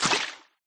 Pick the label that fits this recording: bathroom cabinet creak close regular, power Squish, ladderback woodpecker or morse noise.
power Squish